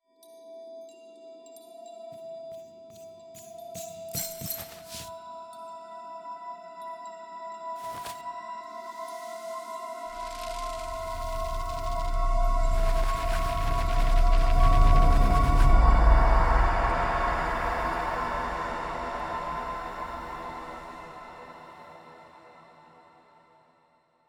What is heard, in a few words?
walk entrance; Bellhart Intro no audio; Hollow Knight: Silksong